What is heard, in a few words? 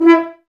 E, Brass, F.Horns, Woodwind Brass Ensemble; Sample CDs; Miroslav Vitous